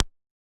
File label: Yamaha DJX 2B, C# Pulse